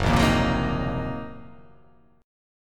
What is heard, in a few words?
Fsharp 6th Flat 5th down, chord